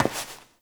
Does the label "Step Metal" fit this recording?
Yes